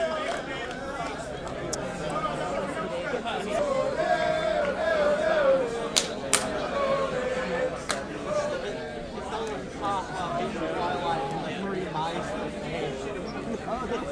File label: random football chant